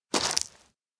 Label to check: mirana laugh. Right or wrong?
Wrong